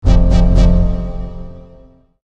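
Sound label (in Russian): уведомление об ошибке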